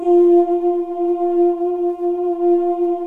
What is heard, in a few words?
viva voce, Korg M-1; Rubber Chicken Software Sample Bank